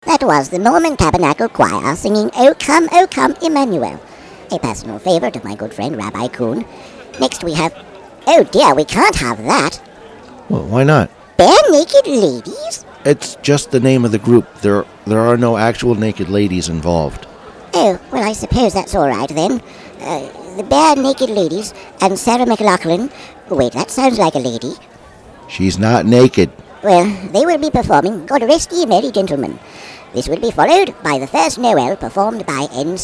chritmas songs

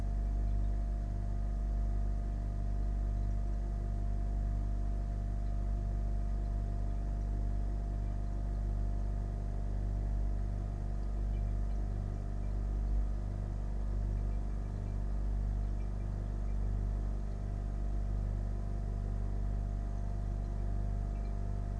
fridge hum

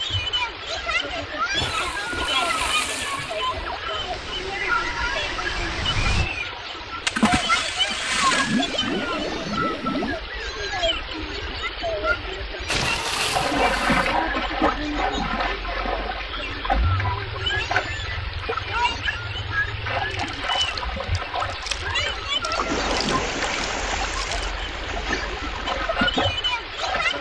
otter kids in water

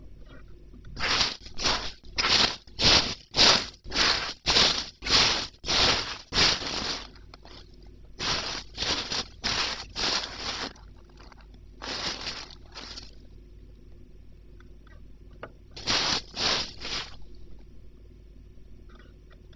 beach steps

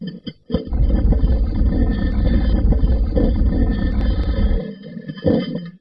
stone opening

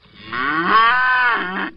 Cows

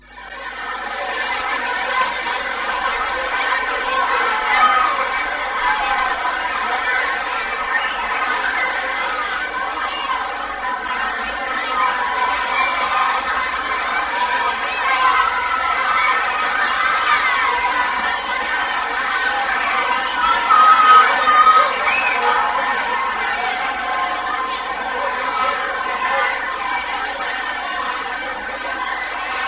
large group of kids

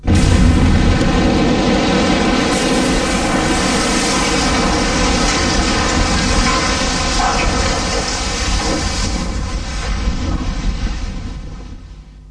explosion

